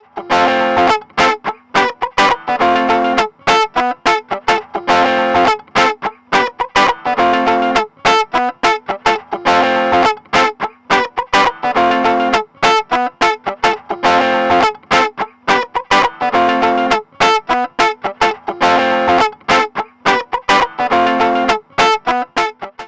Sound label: Ableton Pedal FUZZ Gain0